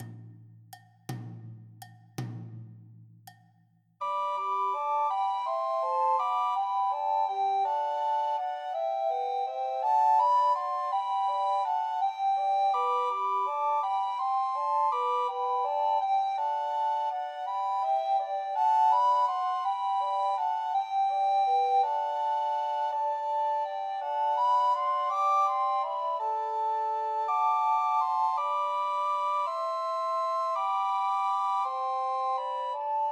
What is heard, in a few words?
Sussex Carol Christmas Trios for Two Descant and One Treble Recorder